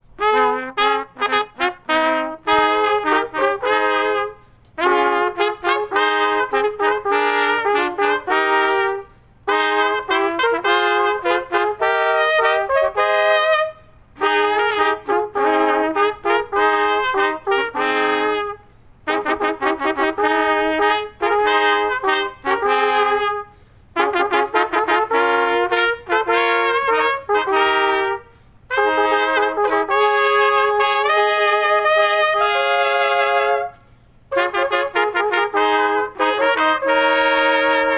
morning trumpets; Holiness unto the Lord

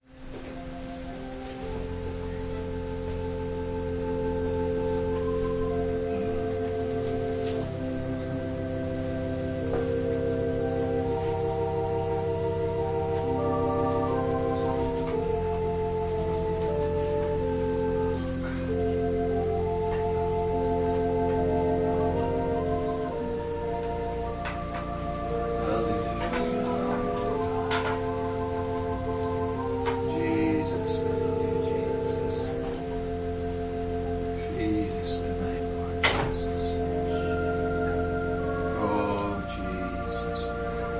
Organ prelude

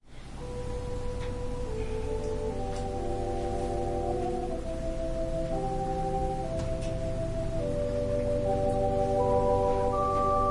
Organ and violin prelude

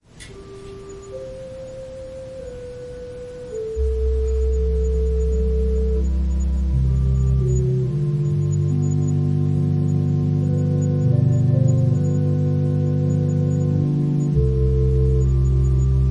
Organ prelude

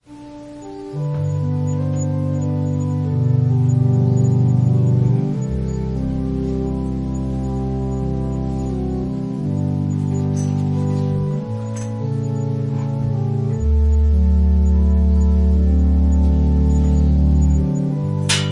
Organ prelude; Who are you?

